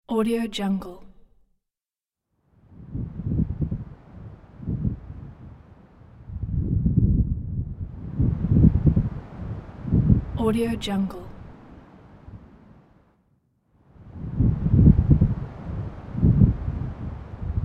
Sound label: wind gusts; lunamycreations